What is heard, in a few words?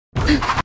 dash; valorant skilleffect; jett dash